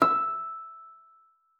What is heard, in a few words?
FLAG D#6, HARP; Xsample VOL-8; HARP+FX